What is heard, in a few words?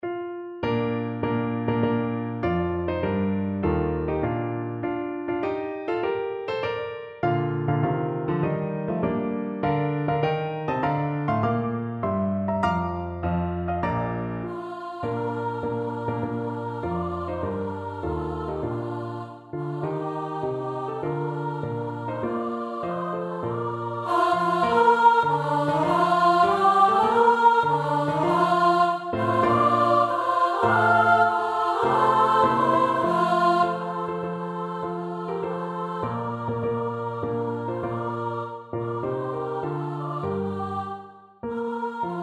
choir